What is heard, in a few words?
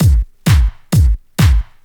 straight, Techno Trance Essentials drum loops